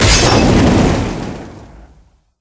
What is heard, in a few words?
molotov detonate